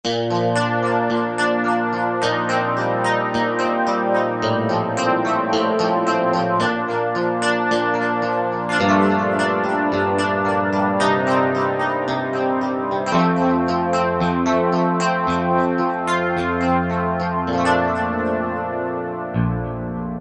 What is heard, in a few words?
Stratified, Korg N364; Factory Prog demos